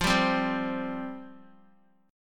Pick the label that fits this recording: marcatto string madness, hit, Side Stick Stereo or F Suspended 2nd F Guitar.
F Suspended 2nd F Guitar